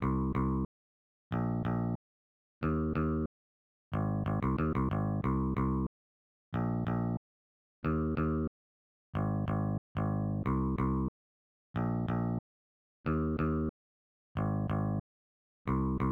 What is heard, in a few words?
Rock n Roll Bass Arrange